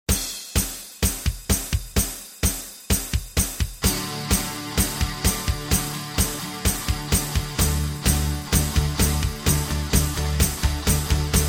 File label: D, backing tracks